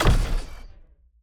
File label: unit create big; Mindustry